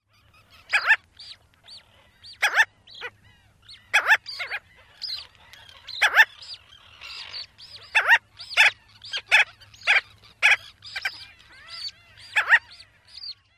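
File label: kay WEK and peeps of chicks, gull-billed tern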